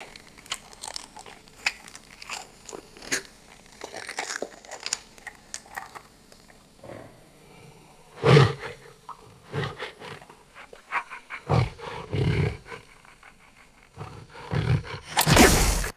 tiger chewing and crunching on a bone; animals

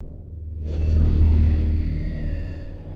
slow sci fi energy sword hum; magic-scifi

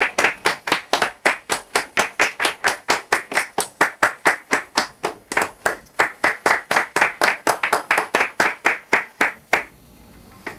series of single hand claps; foley

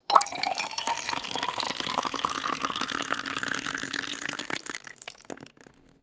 water pouring into a glass

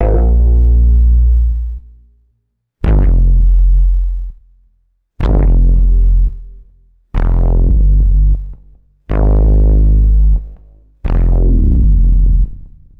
deep analog synth bass hits; designed

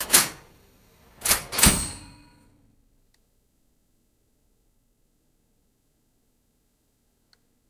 mechanical toaster pops up and ticks

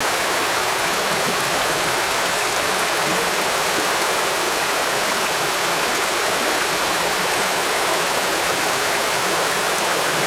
continuous rushing stream water flowing

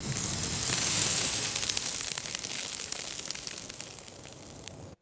quick cascade of dry sand settling; dirt-sand

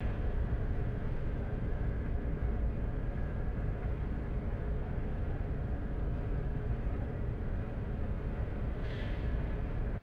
dark airy industrial room tone rumble; ambience

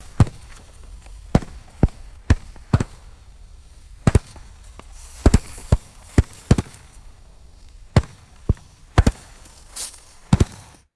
dull thuds of soccer ball juggling

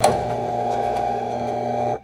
motorized projector screen rolling up

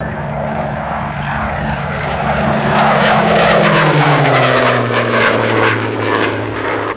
vintage propeller plane passing overhead; aircraft

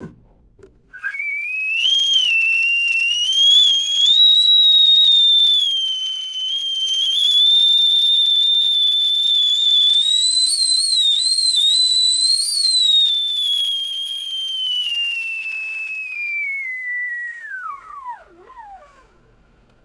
tea kettle whistling and stopping; whistles

